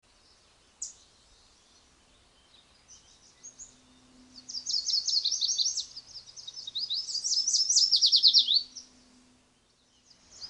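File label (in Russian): melodichnyy raspev samtsa drevesnitsy; zvuk ptitsyi drevesnitsyi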